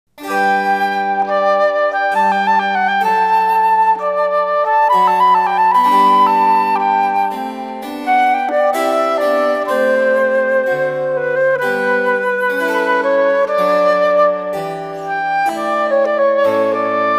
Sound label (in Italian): flauto adagio; albinoni